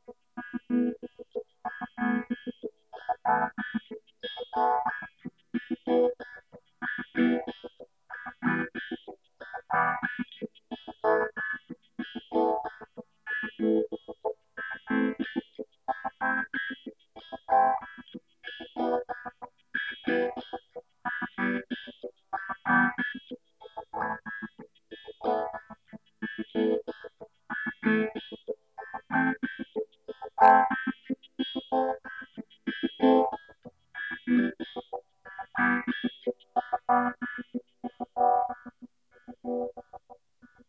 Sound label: Vocorder; "Drum sample" synthese input: guitar, vocoder pure; flame2